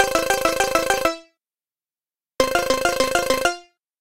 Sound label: Ring